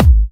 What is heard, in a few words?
Kicks; Vengeance Samples